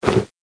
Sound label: Equip; Learn to Fly 3